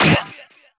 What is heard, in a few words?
thump; HeavenStudio; dog Ninja